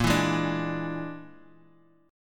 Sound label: A sharp 7th Flat 5th, Guitar DADGAD chord; 8,7,6,7,x,x; down